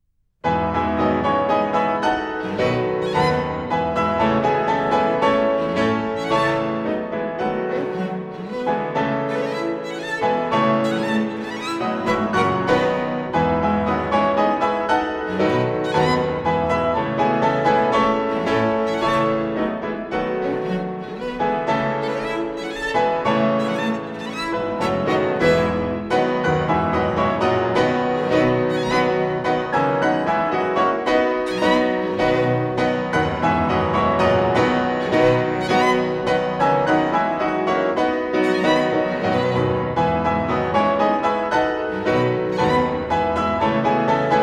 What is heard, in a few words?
Piano Trio in A Minor, Var. VII. Allegro moderato; Tchaikovsky Arensky